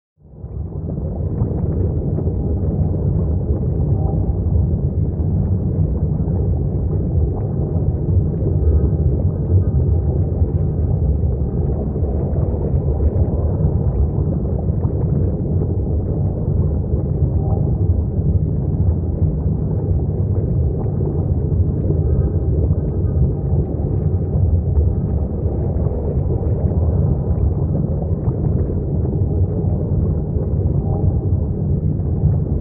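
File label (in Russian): Под водой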